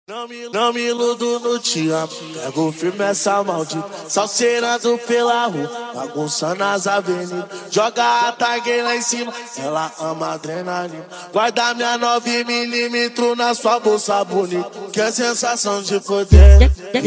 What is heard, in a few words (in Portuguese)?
funk grave